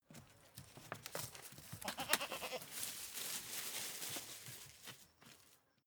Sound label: goat fight movement